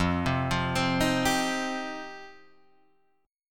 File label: F 7th Suspended 2nd F Guitar Open D